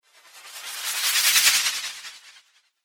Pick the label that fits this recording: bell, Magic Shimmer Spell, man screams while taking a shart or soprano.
Magic Shimmer Spell